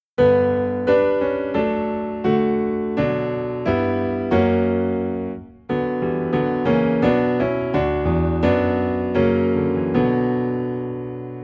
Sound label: Piano Low in B Minor; Jesus Lover of my Soul